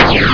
BALL SHOT; HAUSHALT

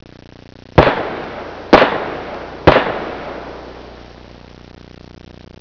gunshots; HAUSHALT

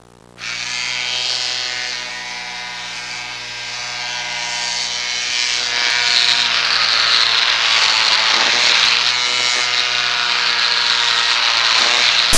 SHAVING; HAUSHALT

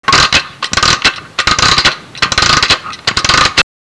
WINDING; HAUS

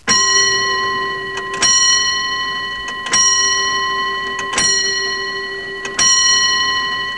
SCHOOL L; HAUSHALT

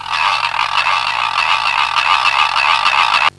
LO NOISE; HAUS

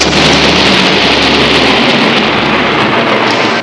CRASHING, NATUR

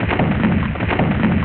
WASHING; HAUS

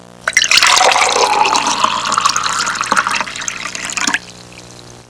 FILLING; HAUSHALT

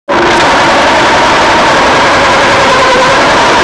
PENCIL S; HAUS

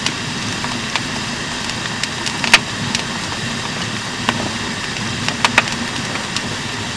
ROARING; HAUS

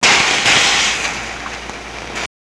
FIZZ; HAUS

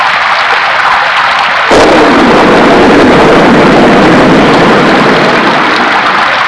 THUNDR; NATUR

